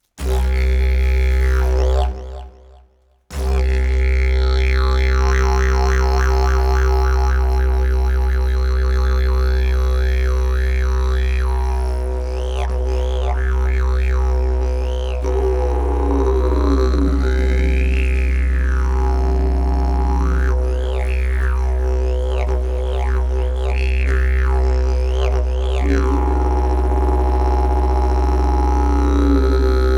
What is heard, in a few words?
Didgeridoo